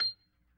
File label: B3 PERC, Vintage Keyboards